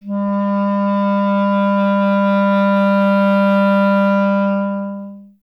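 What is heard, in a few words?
wind clarinet G; Miroslav Vitous; Solo Instruments VOL-1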